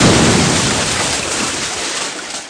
Explosion; Tiki Kart 3D

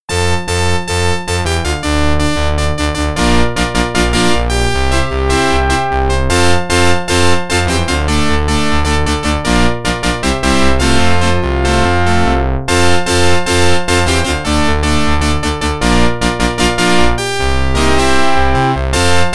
Organ; NHL 94

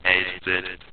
8bit; edge extended; android gamerip 2012

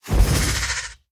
Ghost Strafe; The Town

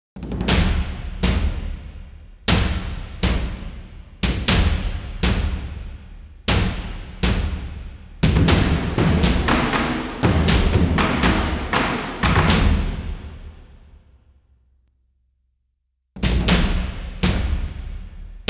Ghost Forest Combat

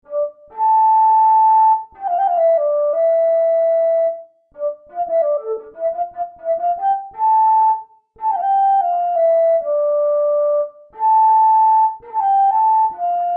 Flute

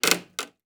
footstep wood creak; Aragami

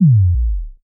Effect End

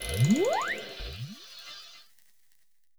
radio solved; adams venture origins; Kismet

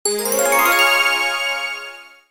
Score Mode Jingle; Wii Play Motion; Skip Skimmer

